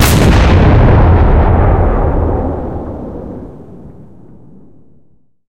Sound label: cannon 125mm 2a46 shot, cannons tanks